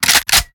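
assault rifle reload empty extended magazine charge; weapons; gmod; viper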